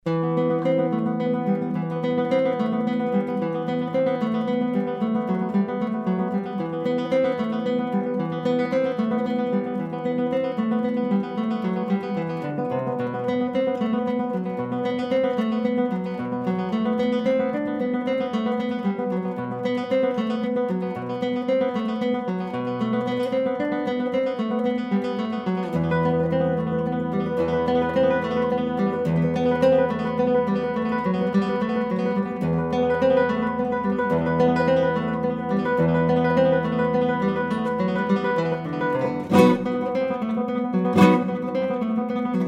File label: Solo Guitar